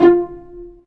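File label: strings F1, String Pizz, AKAI S-1000; Retro Sampler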